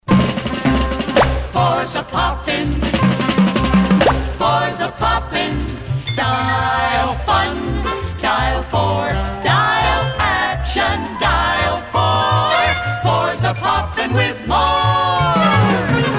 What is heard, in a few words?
Jingle; Lorin Frank Productions; WTAE